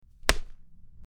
Punch

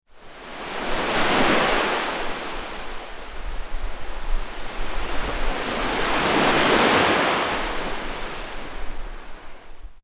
Beach

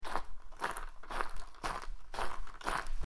footsteps on gravel

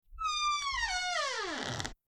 Door creaking

